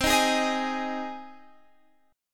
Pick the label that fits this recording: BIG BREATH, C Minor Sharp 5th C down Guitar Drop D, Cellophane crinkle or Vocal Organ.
C Minor Sharp 5th C down Guitar Drop D